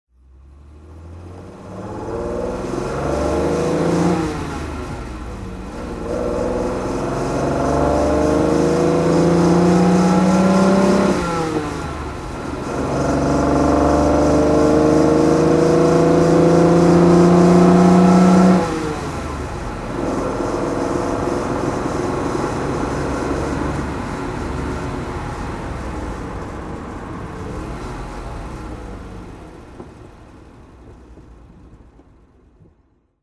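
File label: lancia flaminia zagato driving, Lancia Flaminia Sport Zagato series 2, engine sound; 1963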